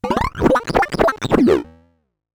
Bent Talking Teacher, Circuit Bent

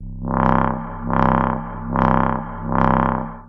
Riff, Synths BASS

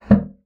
bassoon slap; Xsample VOL-6; C BASSOON

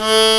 A1 HARMONICA

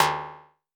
Tempest Analog Snare

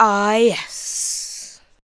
ADLIBS

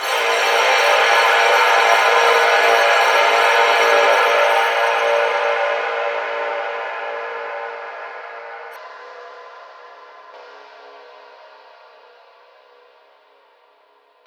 multinoise fade; Sonic Foundry (Sony Creative Software); Ugly Remnants VOL-2; UR 2 FX (Long)

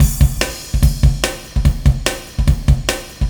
Warp Drive Beat, Processed Drumkits

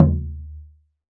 Wadon, Roland BK-5 Percussion

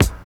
DANK KICK, HIP-HOP; Drums In Your FACE!

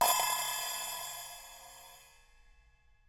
Open Hat up; Digital Sound Factory

